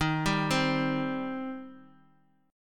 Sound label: Eb 6th Eb down; Eb6 arpeggiated; JGuitar